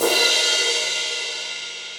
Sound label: Brush Crash 14 Club Sizzle, Cymbal; Drums; Drum Hits; Standard Hits; Ableton; Factory Packs; Session Drums Club